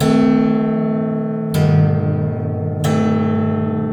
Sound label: Detune, Piano; Piano Destruction